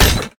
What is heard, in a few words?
kappa death fall; smite; kuzenbo